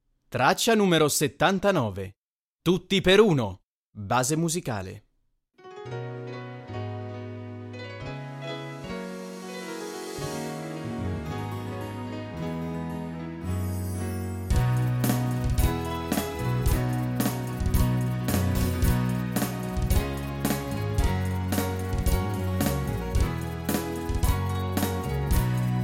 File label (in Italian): tutti per uno base musicale; infanzia